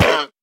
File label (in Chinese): 羊驼受伤声